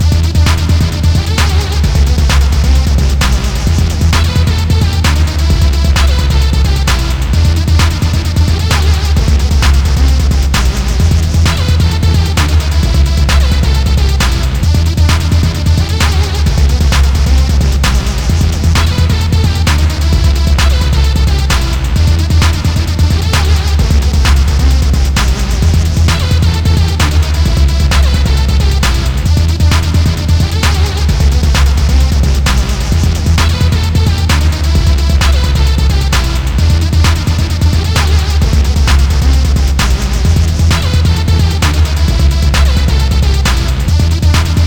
Ghetto Music (Instrumental 2); NBA Live 2004; playstation 2

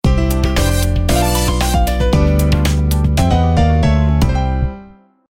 Level Failed; Family Restaurant

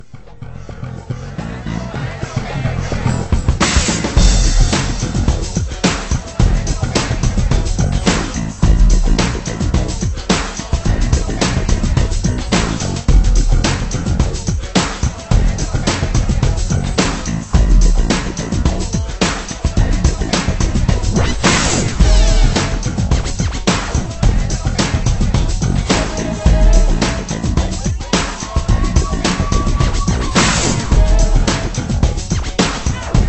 Menu Loop; tiger woods 99 pga tour golf; ps1

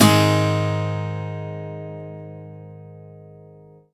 Acoustic Guitar Impact; deputy dangle

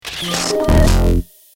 Menu Enter Game

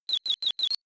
Status Confused; Pokémon SFX Gen 4 Attack Moves; DPPL HG SS

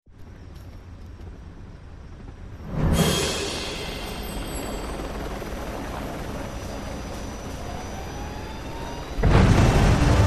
Start Game Boss Rail

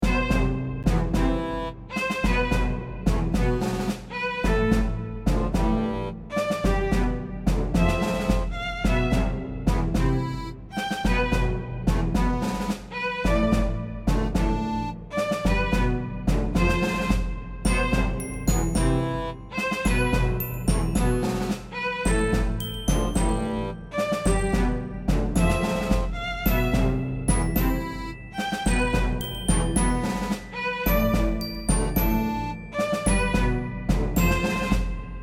Wester (Orchestra); Dragon Fantasy Book I The Volumes of Westeria

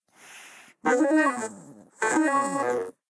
Cyborg Sleep; Cut the Rope: Time Travel

